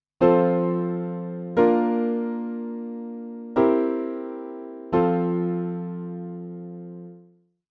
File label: perfect cadence